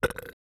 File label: burp; OPENAIR; Anechoic